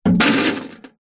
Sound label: foley keys large bunch prison guard place into metal security box